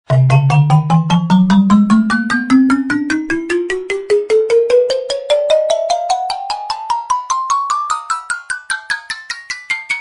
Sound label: WIN COUNT UP; Gorilla